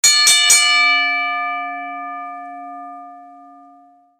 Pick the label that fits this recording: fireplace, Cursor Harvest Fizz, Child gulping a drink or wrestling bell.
wrestling bell